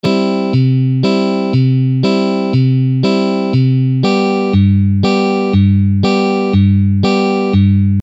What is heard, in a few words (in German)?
Zupfmuster; pfadfinden